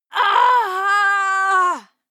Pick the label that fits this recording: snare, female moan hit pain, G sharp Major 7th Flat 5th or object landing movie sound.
female moan hit pain